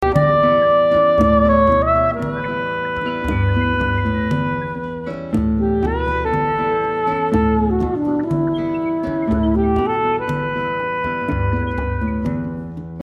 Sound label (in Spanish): saxo, Saxofon